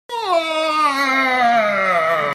eEuUaahah

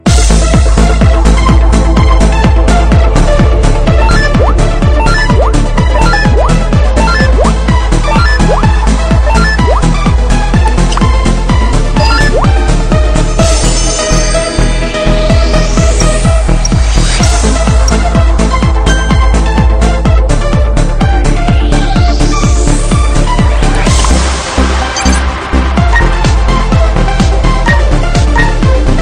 Cool Ritmic

